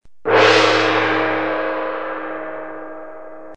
Gong

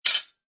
METAL DROP

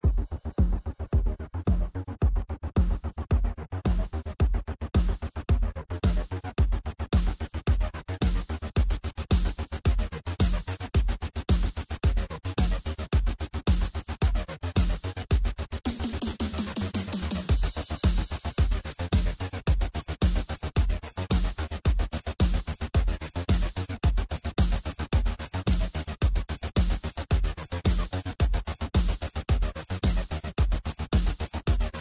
percussion; virtual dj

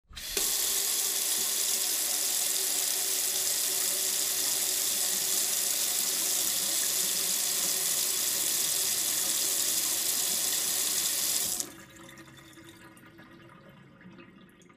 faucet

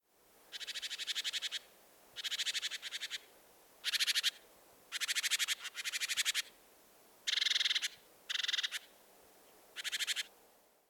Bird

